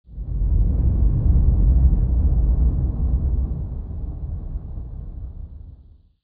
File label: Storm